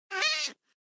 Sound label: hit